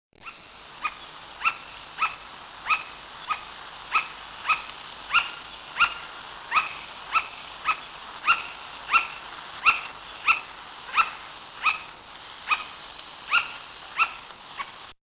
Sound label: sugar glider